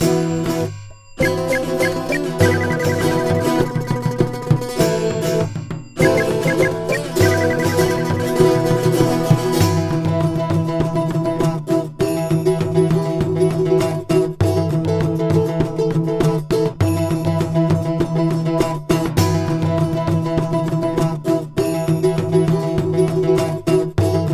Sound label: bgm pot ethnic